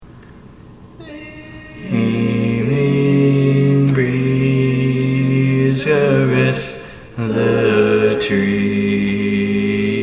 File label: Bass